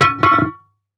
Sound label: manhole cover fall hard surface